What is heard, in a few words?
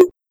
Perc Hollow Wood; pitchglitch